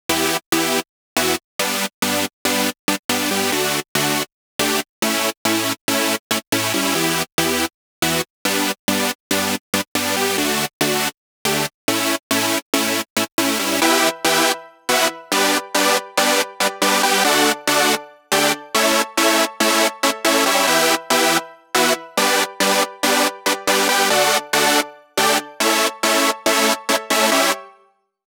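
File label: 140 BPM Intro Chords no sidechain dry; Vengeance - Essential Clubsounds Vol.5; Vengeance Intro Kit; Root E; Synth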